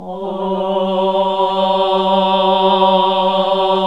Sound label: Nuns Aahhh, AAH G100; Sound & Vision - Gigapack II CD 1 (Roland); HAL NUNS AAH OOH